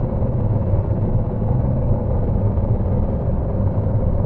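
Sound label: engine loop, spacecraft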